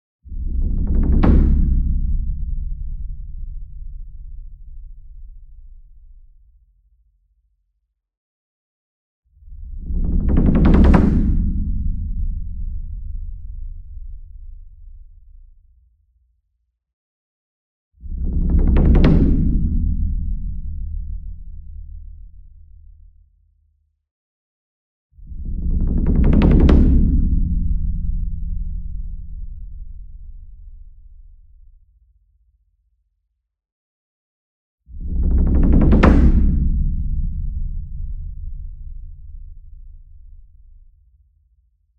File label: SINGLE DRUM Gong Roll Soft Beater Near; boom library; Cinematic Strikes Construction Kit